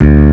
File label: bass; osu